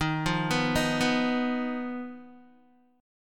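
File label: E flat Suspended 2nd Sharp 5th, Guitar; arpeggiated; chord; 10,8,11,8,11,x; JGuitar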